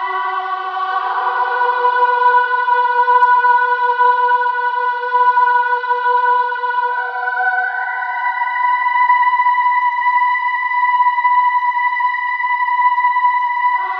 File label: voice lost children; Loops; Sad